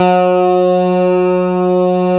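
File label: GT SYNTH, MIDI guitar; East West; Global Sounds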